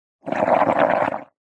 nature water blowing air bubbles in bowl of water foam short burst